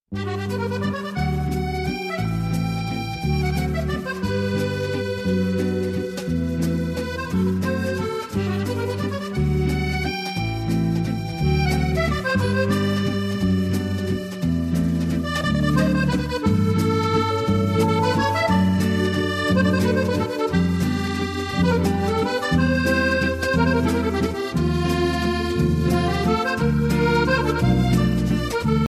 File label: musette